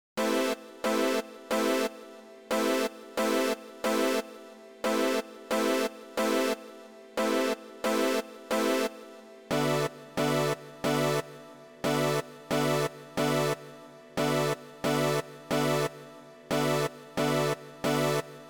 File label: Poly Stacks